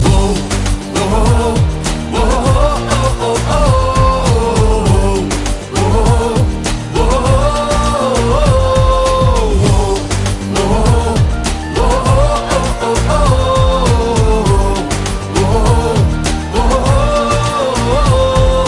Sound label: Oh oh ohh